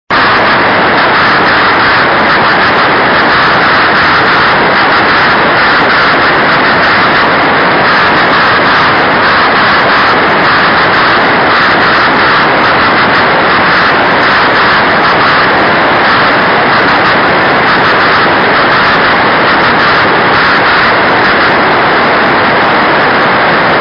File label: 24G beacon; 小雨、気温18℃ 湿度80%; レインスキャッタ？; JH1UGF 横浜市; JN1AYV 茅ヶ崎市